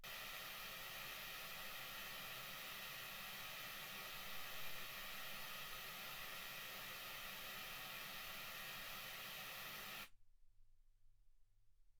wgn 48kHz

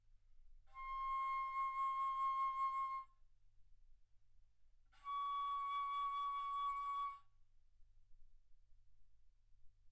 Bass Flute C6 Db6; ff

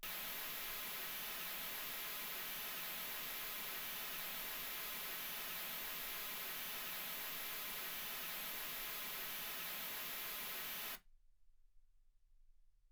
mls 48kHz

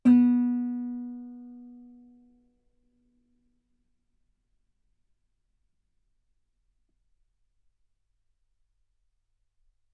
Guitar B3; ff; sul B

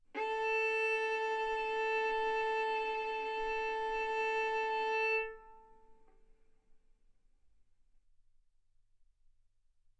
Violin arco A4 B4; mf; sul A; stereo

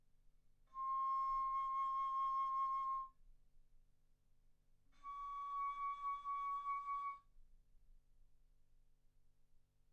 Bass Flute ff C6 Db6

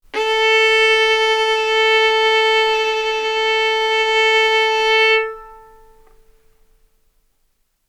Violin arco A4 B4; mf; sul A; stereo; OmniSource

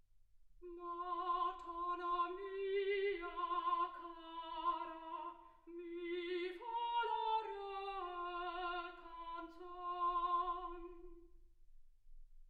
soprano

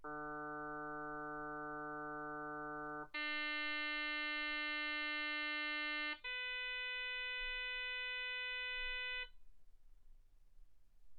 harm sinus 48kHz

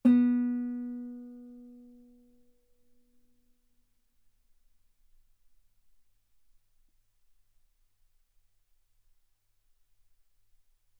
Guitar ff sul B B3

